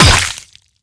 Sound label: Player getting hit; Unity 2D Game